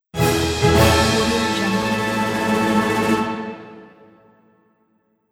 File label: winning fanfares